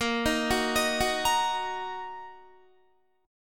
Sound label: Bb Suspended 4th Sharp 5th Bb Guitar; Bbsus4#5 arpeggiated; 17,18,18,20,18,18; JGuitar